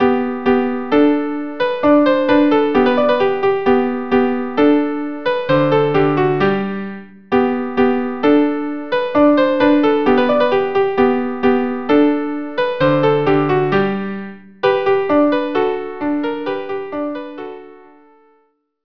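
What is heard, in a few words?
violin viola allegro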